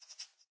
idle, rabbit; minecraft; mob

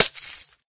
glass

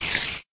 say, spider; minecraft; mob

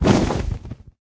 wings, enderdragon; minecraft

